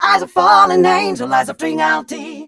f mel c, fem Vocals

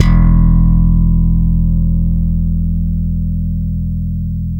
6 STR FUNK; Spectrasonics; Bass Legends

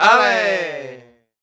VOC, Flamenco, male; Best Service ProSamples vol.46; AKAI

